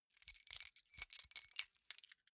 key ring small rattle hard

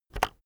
keyboard external enter press very fast soft

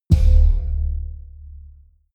door open signal spring deep

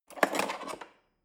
cutlery fork drawer handle scrape

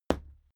fight impact sweetener tiny

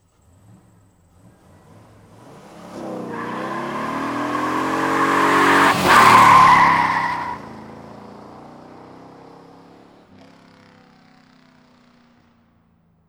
pontiac screeching tyres

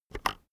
keyboard external enter press fast soft

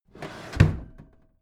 packed drawer smooth clatter close heavy

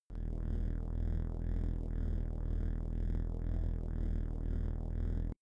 space inside biomedical alien shuttle low pumping

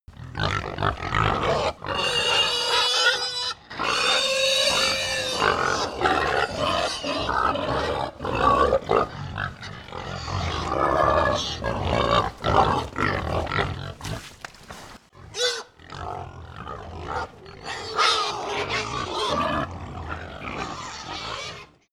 pig herd grunt many

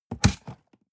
cardboard box close fold scrape short

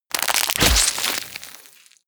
tear out bones fleshy

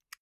zippo flicked close soft

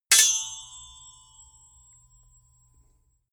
sword hit sweetener

